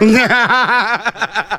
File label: laugh